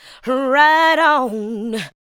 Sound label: ADLIBS